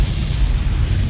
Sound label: superdash fly; hollow knight